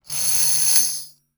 tambourine2 fingerroll ff stereo, Tambourines; MIS Pitches - 2014; Percussion

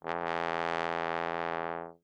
Tenor Trombone ff E2; Brass

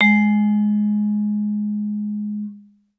Vibraphone short sustain ff Ab3 stereo; MIS Pitches - 2014; Percussion

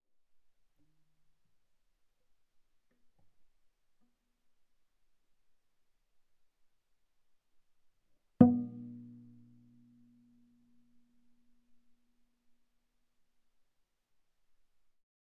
Viola pizz sul G ff C5 G5 mono

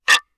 guiro toward ff stereo, Guiro, Hand Percussion

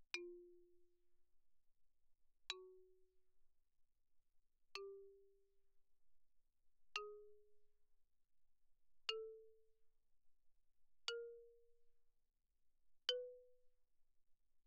xylophone rosewood mf F4 B4; Percussion

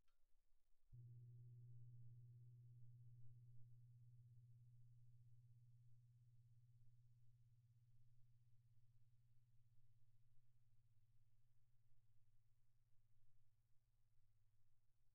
20 wind gong, pp; Percussion; gongs & tamtams

